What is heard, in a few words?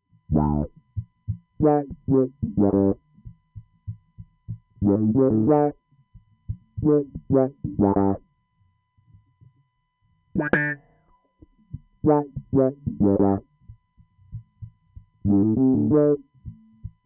wah; enveloppe